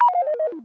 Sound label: Scifi; R2d2